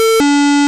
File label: panel med button fail